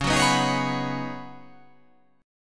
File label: C sharp 9th Suspended 4th C sharp down, chord